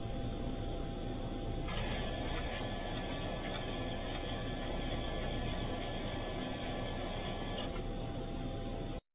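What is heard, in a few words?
pc04 paper tape reader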